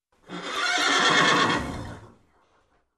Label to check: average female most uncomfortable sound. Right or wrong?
Wrong